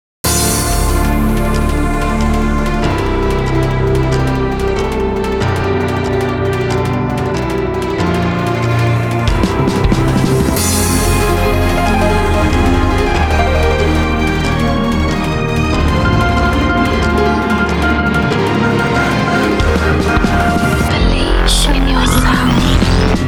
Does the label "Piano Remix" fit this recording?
Yes